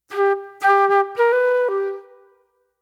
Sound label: Soul Plate Flute